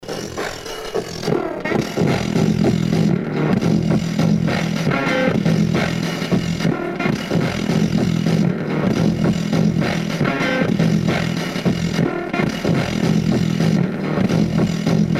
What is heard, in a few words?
Loop; call fire emergency; Anti Jingles et Curiosites